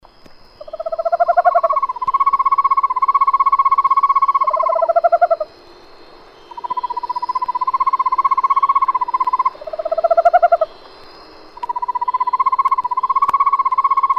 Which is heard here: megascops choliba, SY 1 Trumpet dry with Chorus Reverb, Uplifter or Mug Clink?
megascops choliba